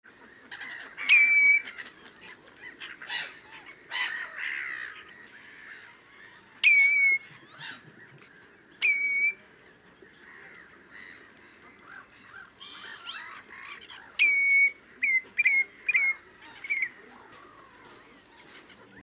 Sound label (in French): chevalier gambette appel